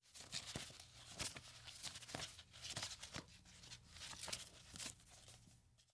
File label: Paper Handle Airline Tickets; Fairlight Sound Lib